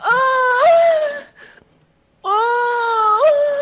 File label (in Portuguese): gemidinho de brother